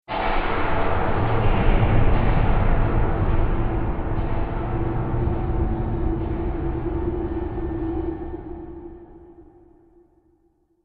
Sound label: Epic Movie Production Atmosphere; bed